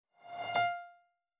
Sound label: piano